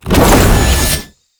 Cast Riser; Warframe; Uriel; Demonium

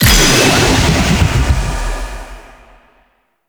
Vortex Ability Cast; Warframe; Duviri; Dax; Ashigaru; Abilities

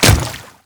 Royal Guard Water Step, footsteps; Enemies; Warframe; Grineer Royal Guard

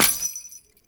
Step Default, Footsteps; Warframe; Grineer; Royal Jester; Foley

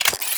Rifle Reload; Warframe; Tenno; Sundial Rifle

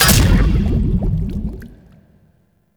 Maw Fishing Attack Impact; Warframe; Duviri

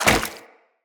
Wall Jump Goo; Footsteps; Wall Run; Characters; Tenno

